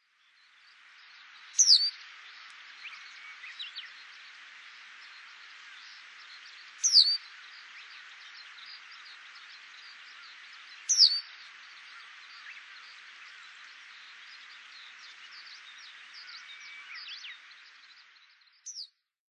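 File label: Japanese Wagtail; utsunomiya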